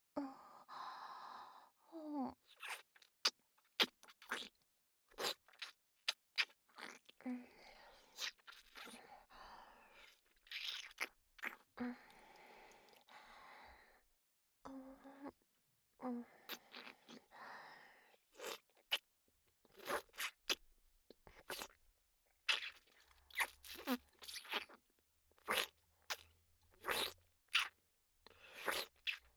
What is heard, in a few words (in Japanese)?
ピストン フェラ のみ